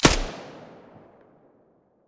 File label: silencer p90 stereo, weapons